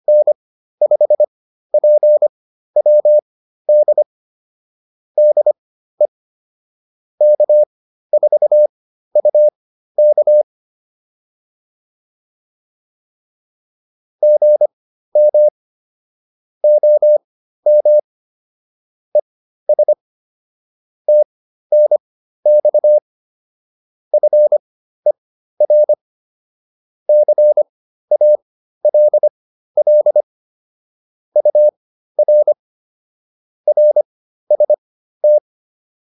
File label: QSO, intermediate practice files